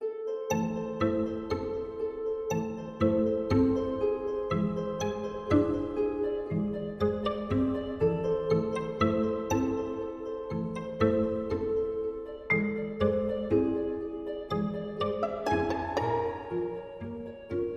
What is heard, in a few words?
Plucked